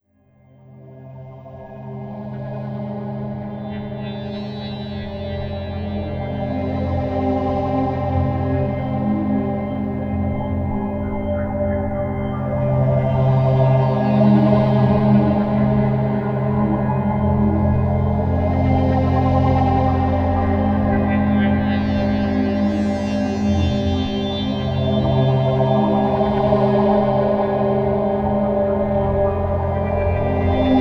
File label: Minimal Bed; Beds; Sonic Foundry (Sony Creative Software) - Slow Silhouette